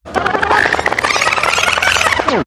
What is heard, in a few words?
Vinyl FX and Scratches, FX Scratch; Vengeance Samples